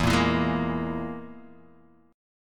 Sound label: E Major Flat 5th, Guitar Open D